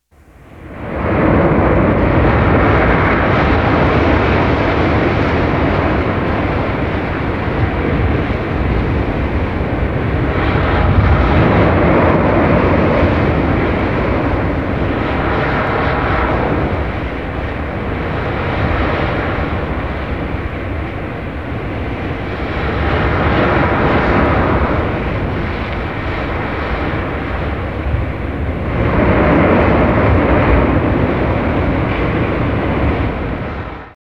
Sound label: Tsunami; Halloween Sound Effects